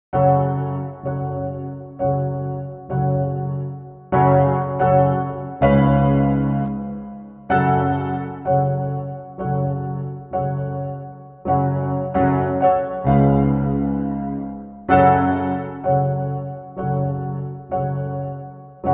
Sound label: piano 64 beats Loop